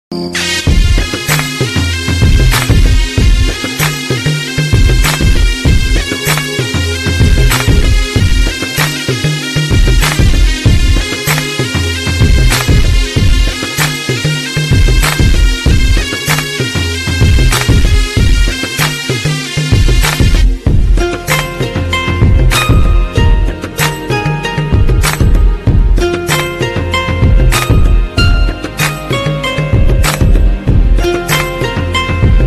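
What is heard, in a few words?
background music ringtone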